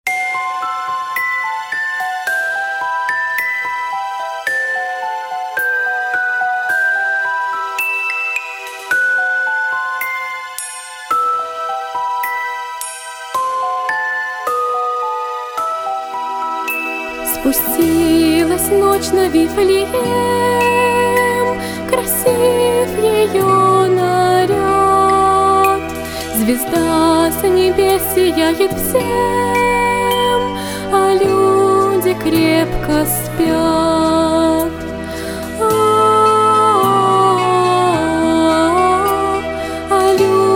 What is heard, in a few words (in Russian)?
ПЕСНЯ СПУСТИЛАСЬ НОЧЬ НА ВИФЛЕЕМ